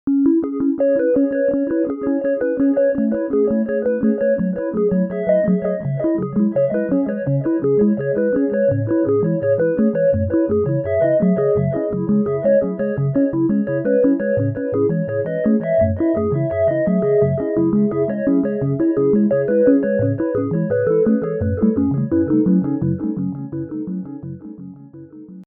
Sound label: Arpeggio Delay